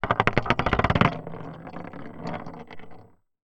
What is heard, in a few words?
Corrigated Iron, contact mic, Metalics